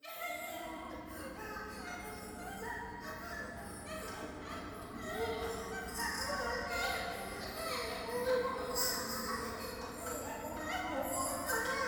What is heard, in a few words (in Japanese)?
トイレ 喘ぎ 電車